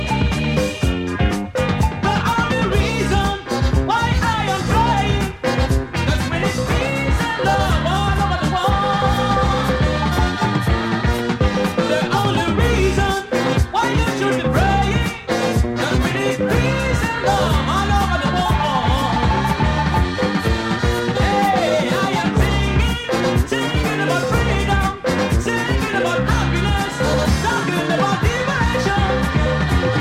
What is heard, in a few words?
Afro Funk Disco Boogie West African Disco